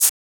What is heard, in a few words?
Shaker; FLPRO